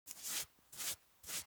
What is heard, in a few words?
object makeup stroke apply sequence